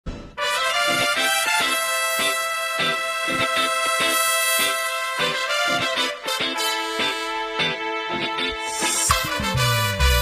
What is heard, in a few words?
title card bgm; seven screen; Leo movie